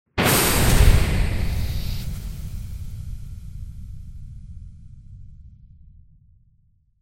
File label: explosion